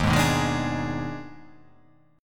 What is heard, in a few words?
D sharp Major 7th Suspended 4th Sharp 5th